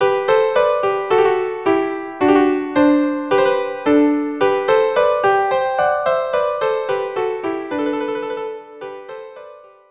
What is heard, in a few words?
Musette viola duo; La Palatine; chedeville